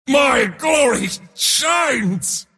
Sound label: attack pain; mars